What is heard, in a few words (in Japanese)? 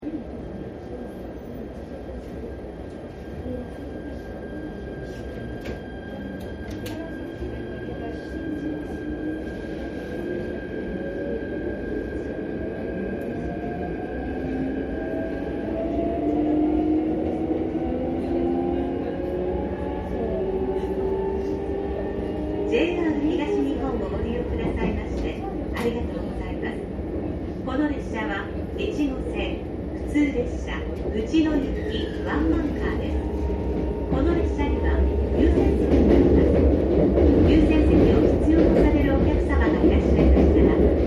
JR越後線 新潟→白山